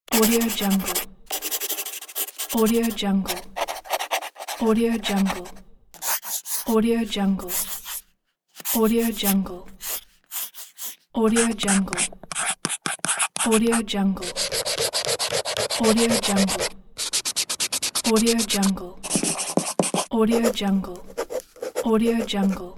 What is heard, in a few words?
scribble sounds; sound-design-pro